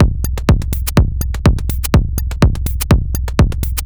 B 124bpm, House Drumloops; Zero-G House Fabrik Drumloops

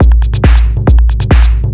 drum, 138, drum-loops, tribal, Techno; Techno ID; tribal-tune

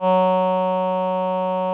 VP-330, String Machines

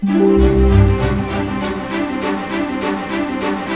BIKE; East West; Scoring Tools; ORC; Bike Ride C

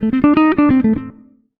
SOLO

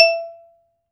A E-4, SHORT VIBRA; AKAI S-Series CD-ROM Sound Library VOL-7

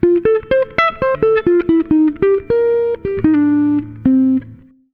FK SOLO, Jazzistic; FNK

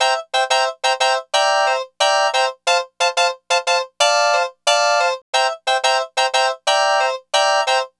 Lounge Hrpsikrd-C; Zero-G Kocktail Kollection; Construction Kitz (Lounge); Welk Lounge 090

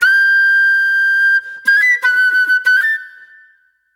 Soul Plate Flute

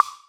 Wood, Block Stick; Equipped Music; Loungin House

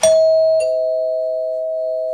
DOOR BELL; BELLS

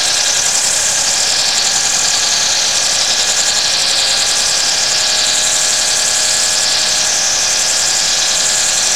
FIRE, GRANULAR, Disjointed Textures; USB Soundscan vol.33; AKAI

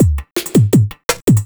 Beat, Drums, Eclectic Electronica

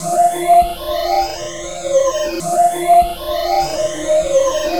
Aerolite Sweep; Sonic Foundry (Sony Creative Software); Myths of Technology

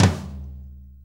6-8 Tom Flam, Drum Loops; Sonic Foundry (Sony Creative Software); Loops & Samples Drum Tools; Flams

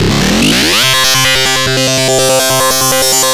GRUNGE

